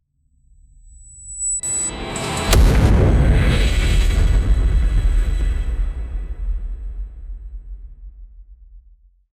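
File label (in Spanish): en español sonido cinemático, dark magic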